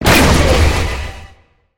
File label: Dark Stun effect